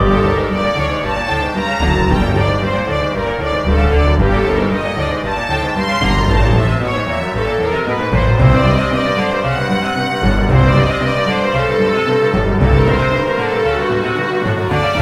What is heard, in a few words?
picikato